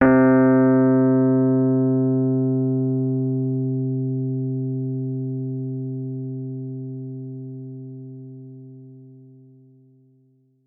Rhodes fortissimo, c3; Session Instruments

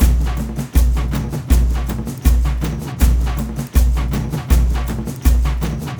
AF TRANCE; Spectrasonics; Heart of Africa 2

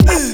fx kick, ragga dub reggae; USB Soundscan vol.27; AKAI

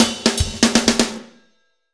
Fast Shuff, Drumscapes Roland; Northstar

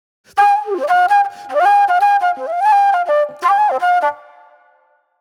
Mellow Soul Flute

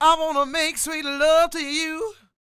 SING W, Vocal Planet Blues & Soul, BLUESY; ILIO

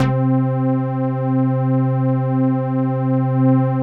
PUNCH C5; Masterbits - Tekkno Therapy

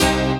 HOUSE, House Dance

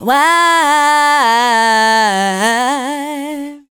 Dance Vocals, FEMALE

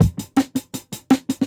Muff Echo, Jungle Breaks; Original Jungle Breaks

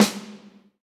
XV Snare, Roland XV-5080

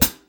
HH PD, Drum 1 Dry; East Collexion

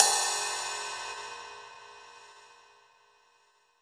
Brush Crash; Roland BK-5

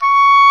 Oboe, Orchestral Winds; Roland LCDP04 Orchestral Winds; WND Oboe f

